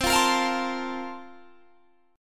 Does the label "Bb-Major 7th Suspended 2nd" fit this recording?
Yes